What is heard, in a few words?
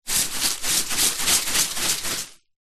rice dry in plastic container shake; zapsplat